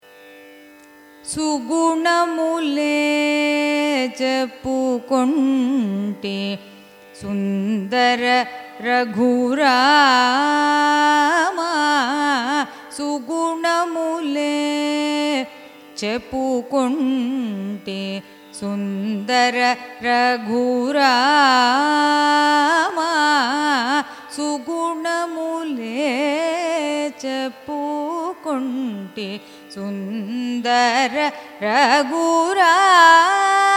raga chakravakam carnatic; sugunamule

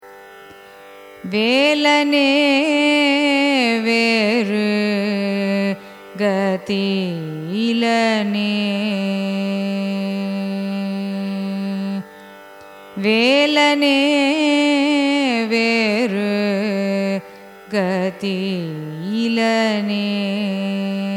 raga subha pantuvarali carnatic; carnatic music

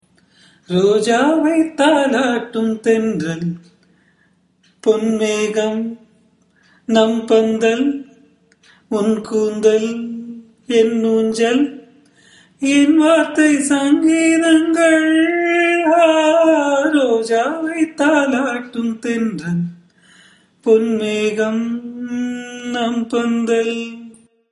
raga pantuvarali cine; carnatic music

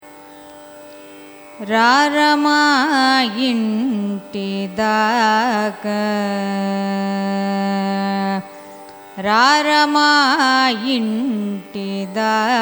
raga asaveri carnatic

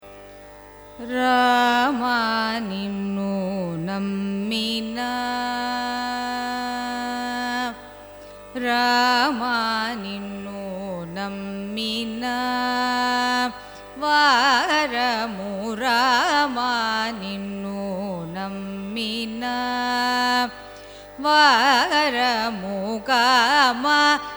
raga mohanam carnatic; carnatic music

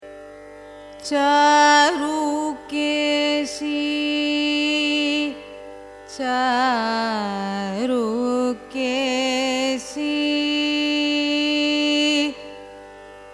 raga charukesi signature; carnatic music

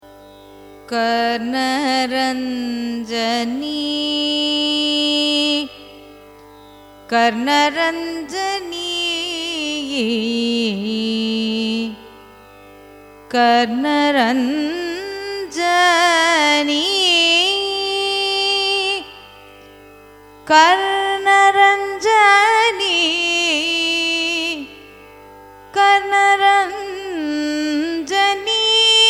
raga karna ranjani signature, carnatic music

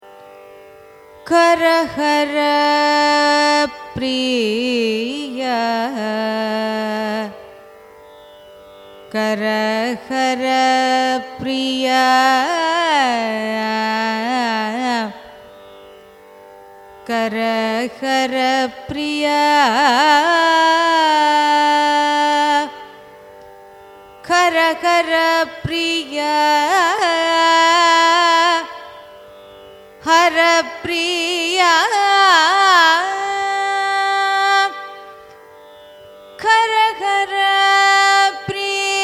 raga karaharapriya signature; carnatic music

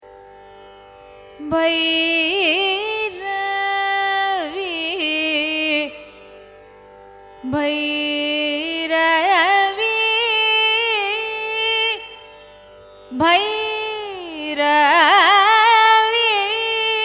raga bhairavi signature; Important Phrases; carnatic music; Listening Homework: Learning Ragas

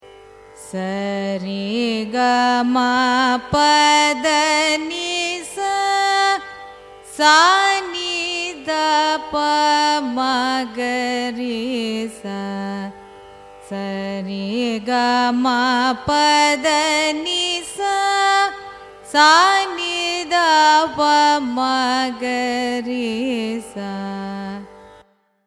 raga charukesi arohanam avarohanam; carnatic music

